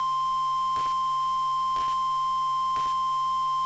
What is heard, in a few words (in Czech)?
zvuk 440Hz